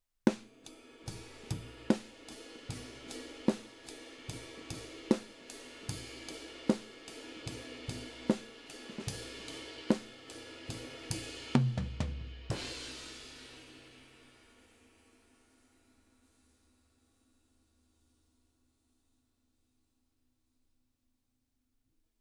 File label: Drums